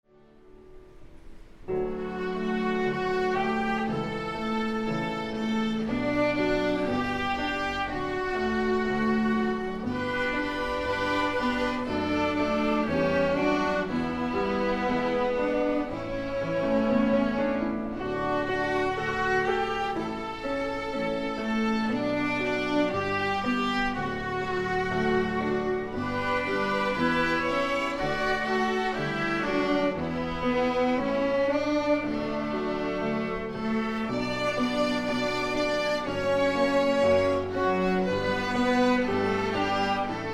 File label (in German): Vorspiel Orchester; So wie Jesus Christus